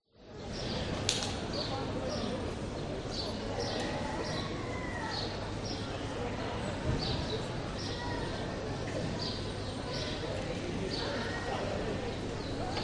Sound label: Crowd Outdoor; audio hero